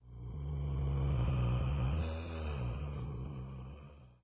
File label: vampire, undeadtower